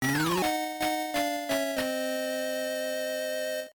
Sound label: death; sonic 8bit; sonicchaos